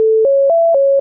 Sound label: beep